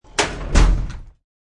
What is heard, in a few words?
Shutting a file cabinet.; File Cabinets; Offices